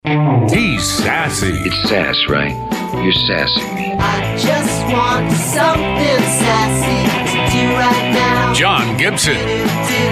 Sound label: GIBSON